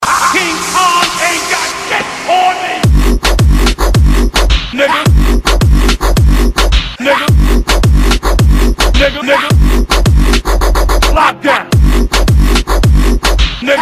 Moombah

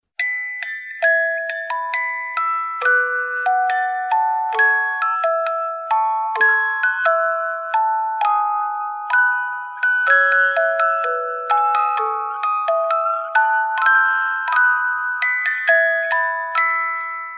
Musicbox

